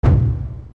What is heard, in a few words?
Bongo; zelda; OOT